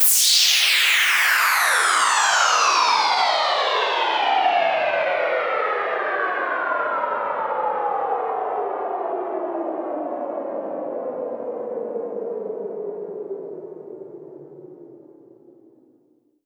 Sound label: Sweeps Down; Vengeance - Essential Clubsounds Vol.4; Effects